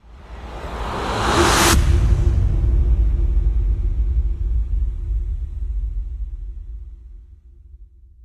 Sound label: Build up